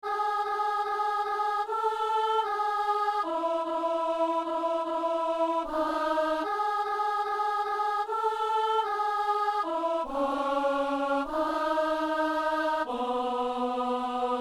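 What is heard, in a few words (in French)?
entends nos prières, alto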